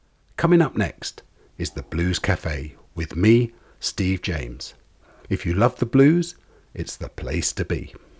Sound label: Dry